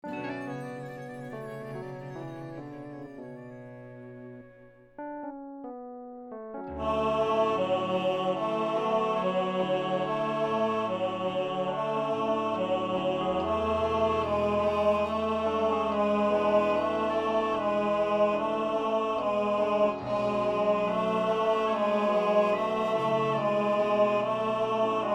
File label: Tenor Baritone, rehearsal